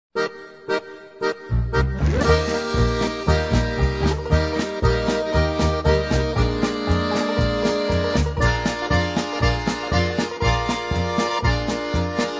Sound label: BLACK CROW POLKA